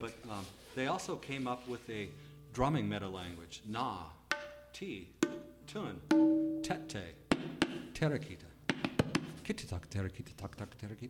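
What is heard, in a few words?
Tabla Demonstration; Live concert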